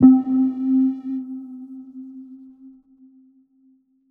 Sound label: Keys C, Trap One Shot; HipHop; Melody Kits; Ghosthack; AC2024 - Day 5